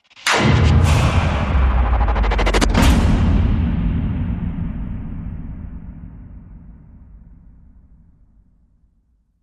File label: Hit Stress Fracture